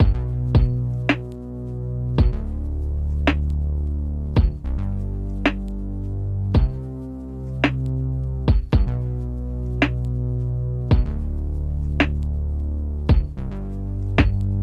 benkyou loop

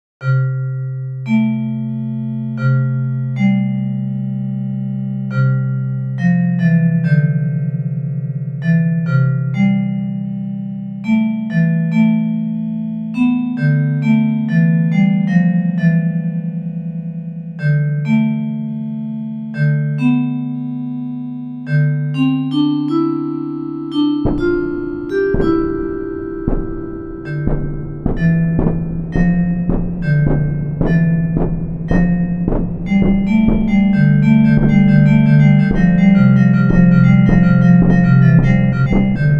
bells

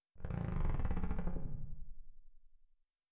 door open

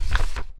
Flipping Pages

